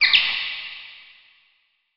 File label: bat spawn; Blud Mage; spawn the bat